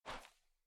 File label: step; snakeinabox; double time pitch shift